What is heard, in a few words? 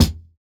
Kick, jungle breaks drum sounds